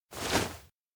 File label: thumbs up; spica; anemoi